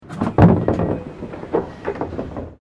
Train Series E731 Express External Door Opening, Japanese Trains; Aomori; Vehicles